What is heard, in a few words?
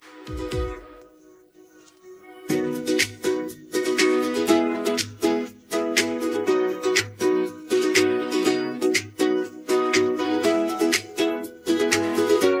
I Have Decided to Follow Jesus Long Instrumental